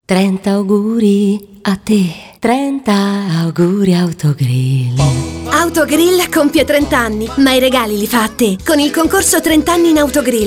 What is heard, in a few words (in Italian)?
cantato; Calda; AUTOGRILL